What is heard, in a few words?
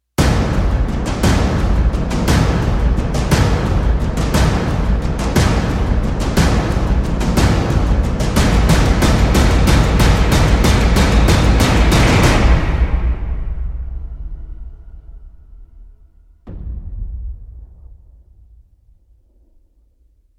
drums speeding up no rise